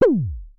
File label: Synare Filter; Star Instruments Synare 3